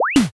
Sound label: cut in